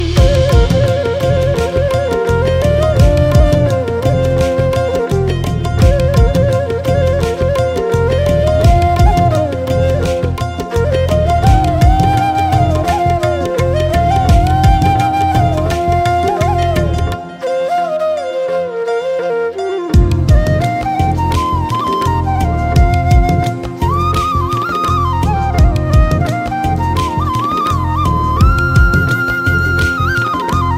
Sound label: FLUTE RINGTONE